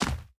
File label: footstep; Smite; god Khepri